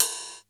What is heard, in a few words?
SHORT RC, D110 DRUMS; Crypton - DrumBase5000